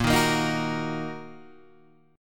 Bb Suspended 4th Sharp 5th, Guitar, Half Step Down; Bbsus4#5 strummed; JGuitar